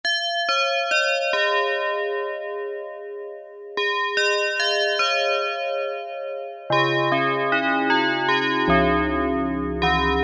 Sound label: TUB BELLS, Yamaha DX7